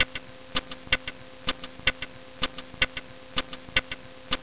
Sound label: CLOCK; 999wavs